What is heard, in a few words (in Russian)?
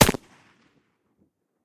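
дальний глухой пистолетный выстрел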